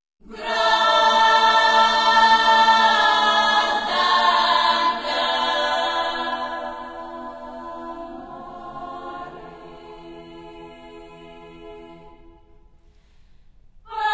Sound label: Bulgarian Polyphony; World Sounds